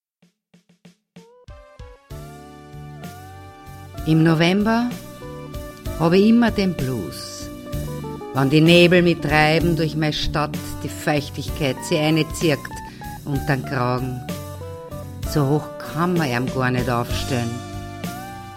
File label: blues